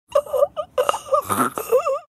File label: death sound; phasmo